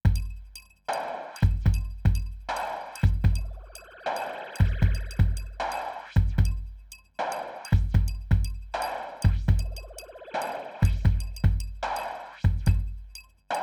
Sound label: Full Kit Synth Snare; Space Travel 7